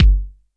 kick, Roland R-70, BD

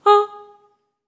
STACC G#4, Symphony of Voices, SOP STACC

